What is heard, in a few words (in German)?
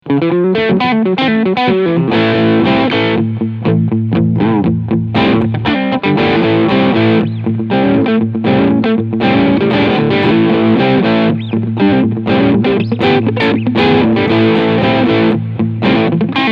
Crunchsound beide PUs